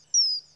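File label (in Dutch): ijsvogel, kingfisher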